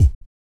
BOOGAROLL, Vocal Blues Soul; Spectrasonics Vocal Planet

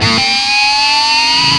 Feedback, Industrial; Nasty

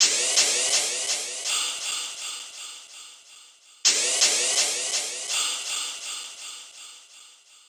Vox Fx Loop 125; Equipped Music

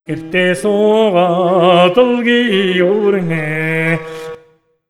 MONGOL V, Asian Traditions

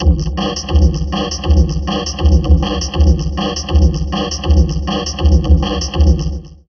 Drum Loops Crazy Processed; 160 CHAOT; USB Soundscan vol.07; AKAI

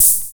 Hi Hat, drums; Sonic Foundry (Sony Creative Software); Drums & Drones; Construction Kits; Squeeze Kit

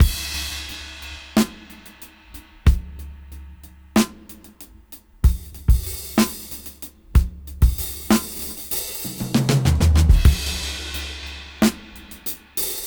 Half Time Slow 94 BPM; AMG - Keith LeBlanc Phat Butt Jams

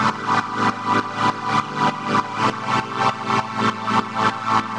Pad 100 A, sidechained samples 100bpm

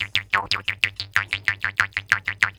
KHOMUS

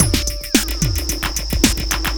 808 odd beat; Sonic Foundry (Sony Creative Software); Ugly Remnants VOL-2

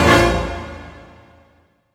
hit, orchestral; Orchestral Loops

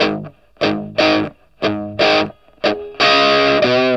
Vintage Blues Guitar, 60, GS; USB Soundscan vol.22; AKAI

